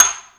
Perc Metal; Sabbyt Metal

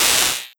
HiHat; pitchglitch samples; Moca

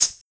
Shaker Long

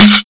Perc Shekere Hit; pitchglitch

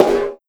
Perc Gas Can; pitchglitch

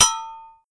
Bell Dununba Bell; circ Afrique V4

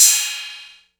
ride; foxdot default

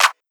Clap; pitchglitch samples; DrumDigger

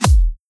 Kick Glitch Rev

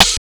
Snare; pitchglitch samples; DNBACADEMY LIQUID STARTERKIT

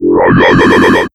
Sound FX Growl; Fellen

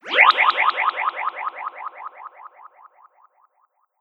Old Dub

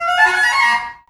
Sax; pitchglitch samples

